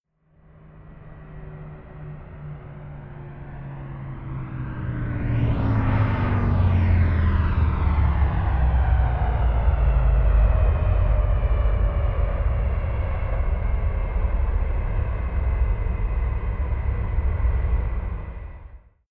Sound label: plane bombardier learjet flyby right to left distant; flugzeuge verkehrsflugzeuge; verkehr transport